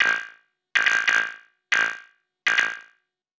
TECHNO EXTRA 140

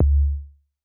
G2 Pure Tuned Kick, Tuned Sub Kicks; One Shots; Touch Loops; ElementsOne; Abstract Rhythms

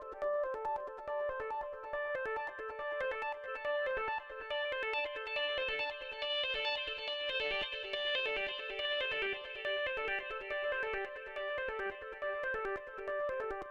SPACE SYNTH 140 A; SpaceSounds

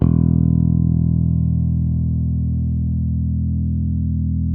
BASS E 1, ELECTRIC BASS

